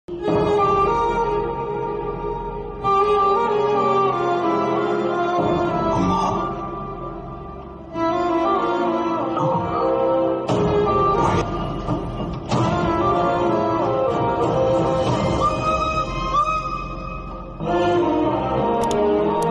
relation bgm emotional bgm ringtone; waltair veerayya

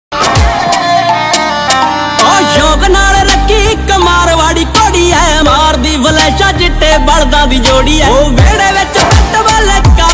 song ringtone

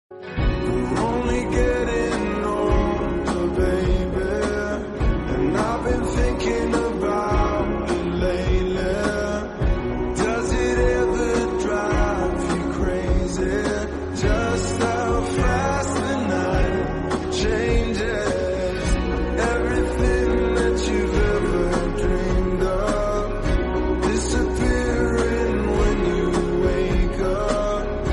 slowed reverb ringtone music beats

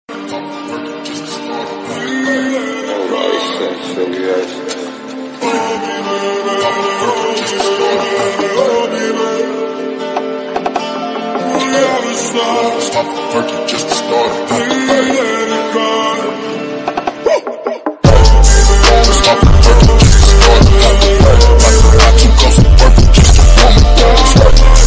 background music; shayri background music; tik tok viral bgm

